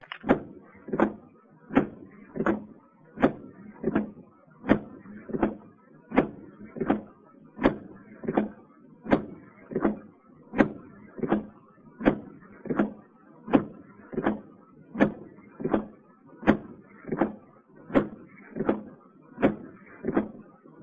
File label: Slow wiper